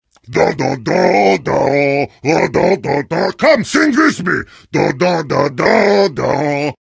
cheers; heavy; source engine; tf

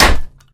bat baseball hit world; tf

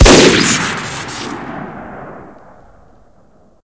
sniper shoot crit; tf

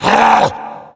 growl; video game; Left 4 Dead; Source Engine; tank; tank spot prey

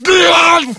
rage at victim, infected, male; npc; Left 4 Dead 2; Source Engine

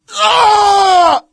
crit death; video games; source engine; tf

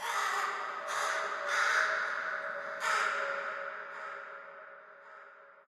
amb crow; source engine; portal2; birds

